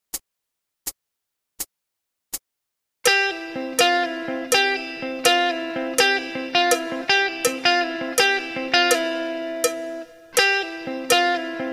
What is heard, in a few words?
lick, guitar